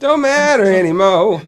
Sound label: don't matter any more SUNG; expletives